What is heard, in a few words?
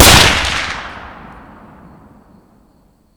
Inf Weapons; Red Orchestra 41-45